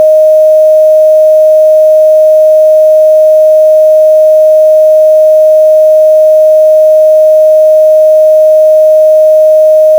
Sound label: Sine 622; 48k; PCM24; LR; nodither; 0db